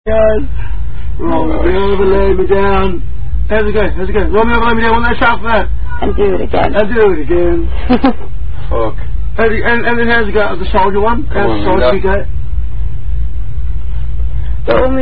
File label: Drinking Song; Roll Me Over In The Clover